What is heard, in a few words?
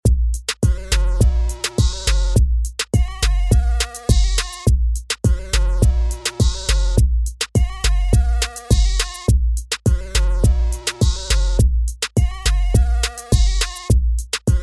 Reggaeton